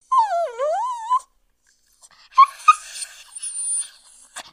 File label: Dog Maltese Whine Hig